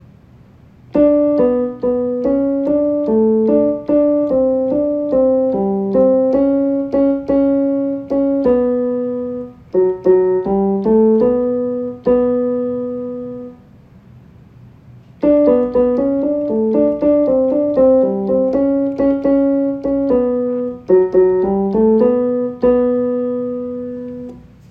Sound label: Tenor and Bari